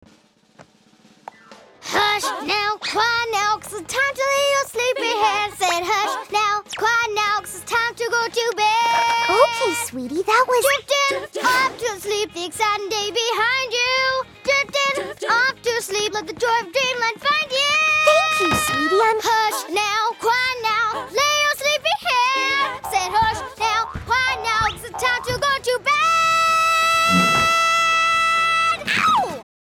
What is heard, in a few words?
Acapella; Season 1